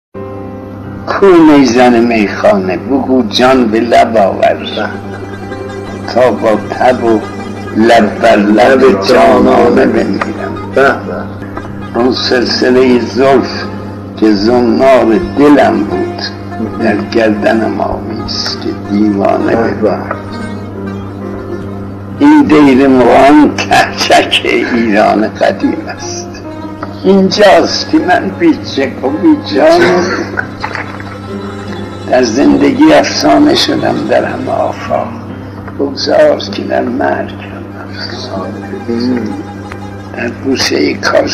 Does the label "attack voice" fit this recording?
No